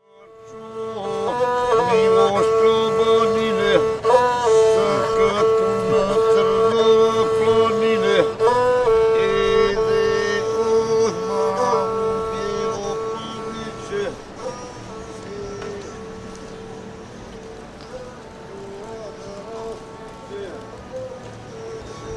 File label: guslar, street music